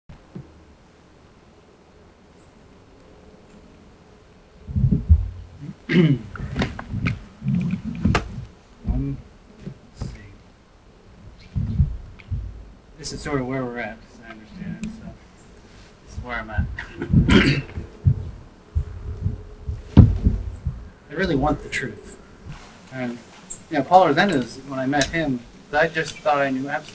extreme birdsong noisy birdsong

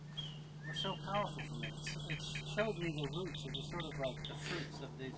extreme birdsong

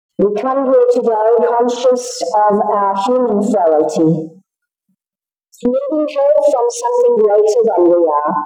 gross overload declip denoise eq compress

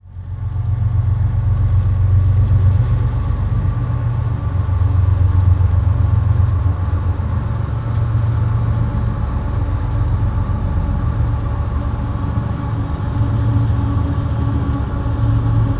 train audio, Behringer ECM8000

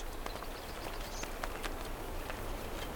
flap, nature

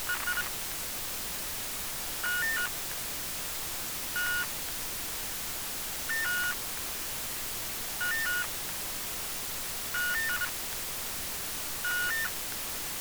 beeping sound, canon xf105 cam b beep; canon xf series

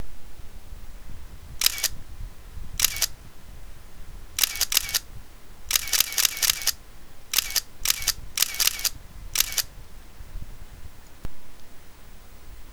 camera clicking shutter Nikon F3 firing

